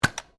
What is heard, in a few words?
GUN TOY DART PISTOL FIRING; Toy Guns; Toys